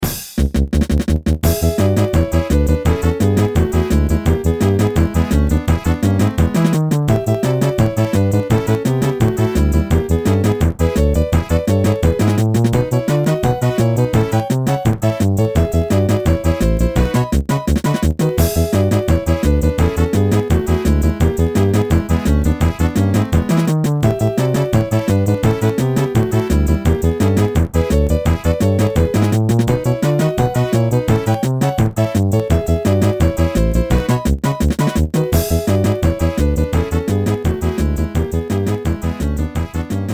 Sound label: Battle; Go-Rock Squad Grunt Weak; Ranger